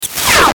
static harsh; Static Harsh Glitch; Production Element Imaging Element Accent Transition; Blastwave; Static Sound Effects

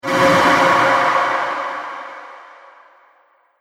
Epic Sf Explode Impact; Sci Fi Sound Effects

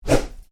Swish: Short, Slow, Whoosh; Whooshes; Sound Design; Stuart Duffield

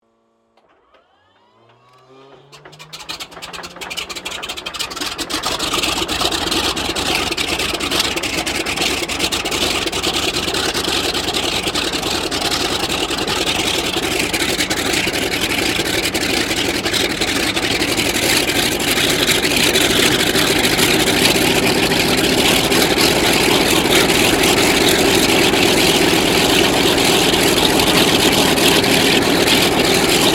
AIRCRAFT CA-13 BOOMERANG STARTUP STEREO; Airplanes; Vehicles

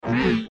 Sci Fi Robot Moan, Sci Fi Robot Moan Short; Robots

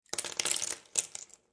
Food Nuts Pistachio Set Down On Kitchen Counter; SFX Bible 6

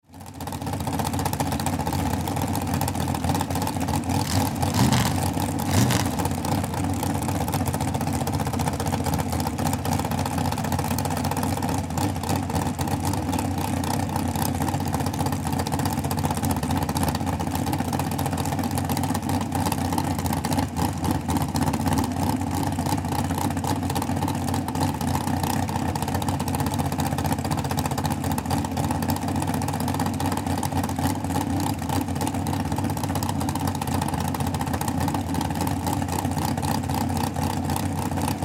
Swamp Buggy Idle; Transportation Watercraft

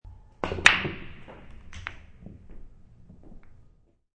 Pool Break; Sports

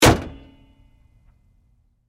Truck GMC Savana Hood Close; 2010 GMC Savana; Trucks; Vehicles

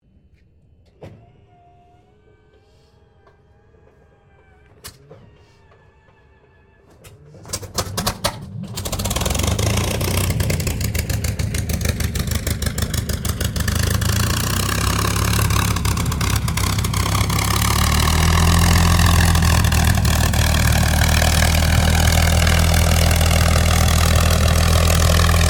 AIRCRAFT CAC CA-18 MUSTANG MK23 STARTUP IDLE TAXI; CA-18 /P-51 Mustang; Airplanes; Vehicles; Aircraft Collection; Twistedtracks

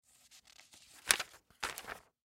Magazine Page Turn; Paper; Materials; foley; Blastwave

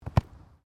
SOCCER BALL DROP KICK

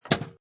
Pinball Sound Clunk; Video Game Sound Effects; Lynne Music

